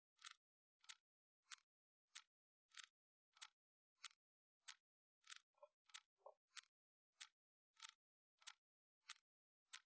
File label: drums; SiSEC10; underdetermined